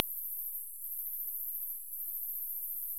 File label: Kerivoula papuensis